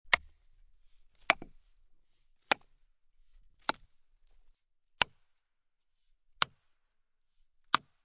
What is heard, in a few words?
axe